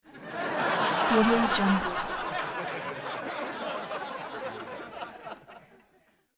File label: crowd interspersed laughs studio